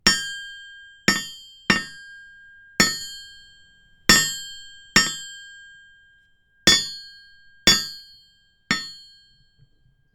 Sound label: Several Hammer Blows On Anvil